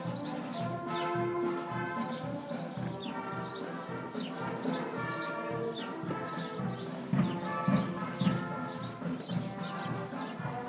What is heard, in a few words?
sounds of rural switzerland